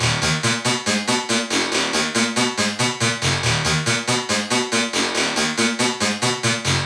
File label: Riddim Melodic Loop - D# 140; Scraper; Ghosthack; Riddim Essentials Volume 2